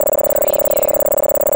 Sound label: Computer Readout